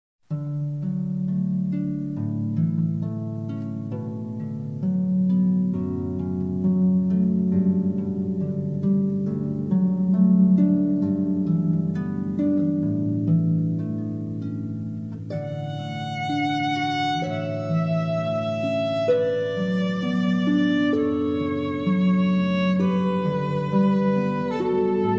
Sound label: Canon Duet, harps